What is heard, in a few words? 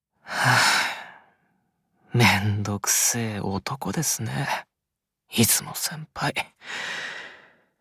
YAY